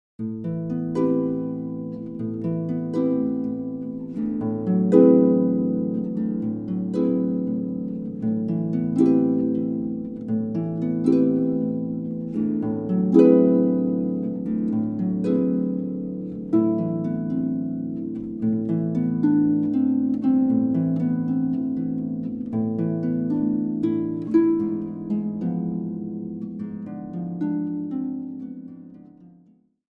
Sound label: chords